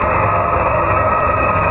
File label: ship outside